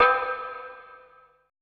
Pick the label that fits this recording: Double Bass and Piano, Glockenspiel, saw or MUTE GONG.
MUTE GONG